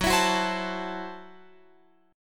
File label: Gb Minor 7th Flat 5th Gb Guitar Open F; Gbm7b5 strummed; x,13,16,13,15,16